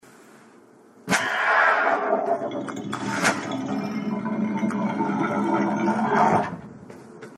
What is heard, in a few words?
opening door; Titan Souls